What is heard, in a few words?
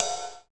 Drums